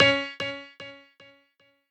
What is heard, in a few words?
piano sabi